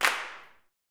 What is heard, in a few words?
CLAPS, Gospel; Spectrasonics; Vocal Planet Vol.1 Gospel; SNAP